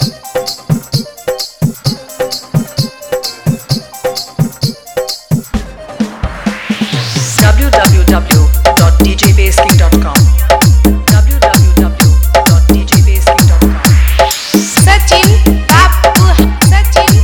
Hard Vibration Mixx

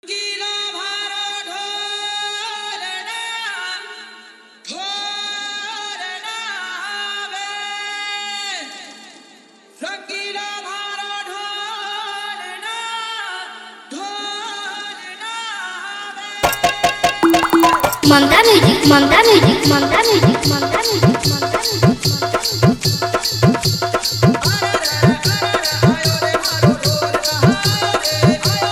Old Desh Bhakti Dj Remix Songs

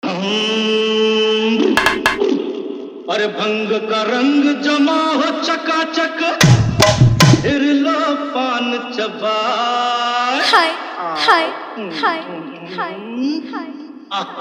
Old Is Gold Hindi Dj Remix Song

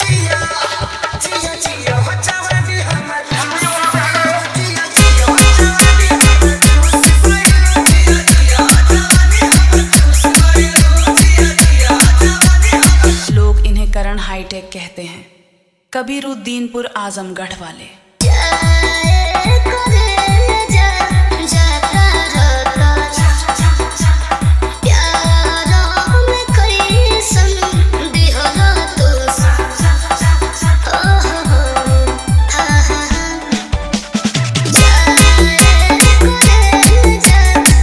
Bhojpuri Song Hard Viberate Bass Mix

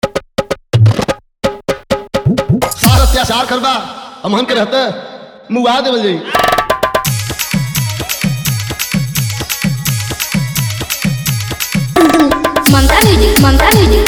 New Bhojpuri Dj Remix Song

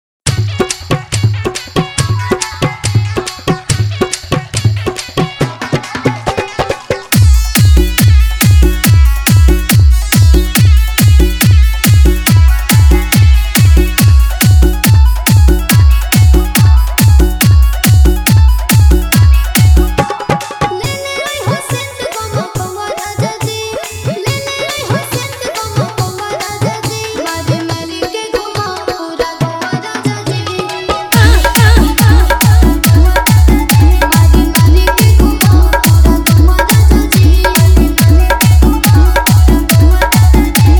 Full Hard Bass Viral Bhojpuri Dj Song

